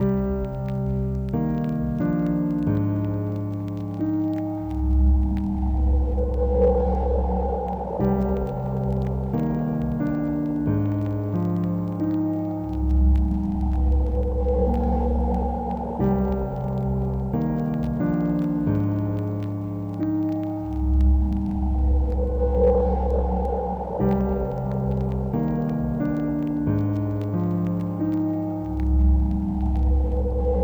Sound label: atmo